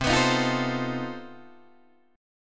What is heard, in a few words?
C Minor Major 13th C down Guitar DADGAD